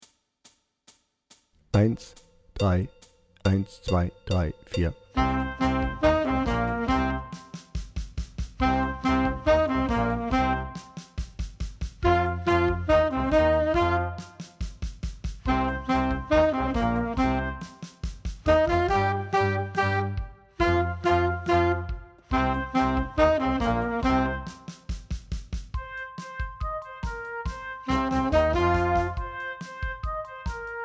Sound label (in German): Hard Rock Blues Tenorsaxophone lauter